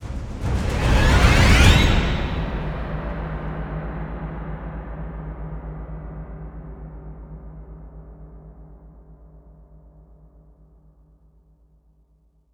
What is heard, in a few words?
Combat Start Stinger; Battlefield 1